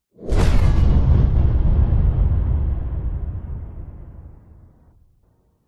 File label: warfare missile bomb incoming whoosh into large explosion